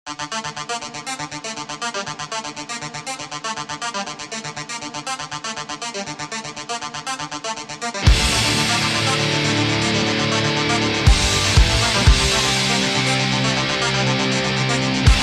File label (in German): deutschland ringtone